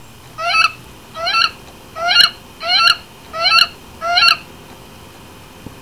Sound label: Wood Duck